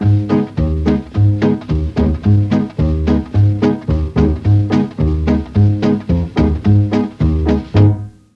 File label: G#1 SAX, Mellotron; Mike Pinder - Mellotron Archive CD; FOXTROT+SAX